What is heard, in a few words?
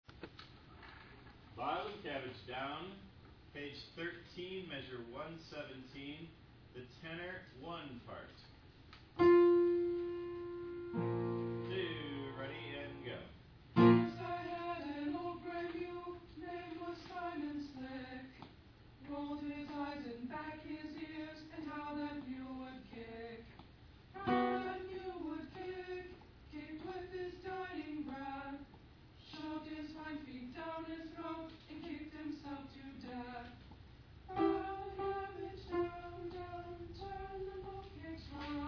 TENOR 2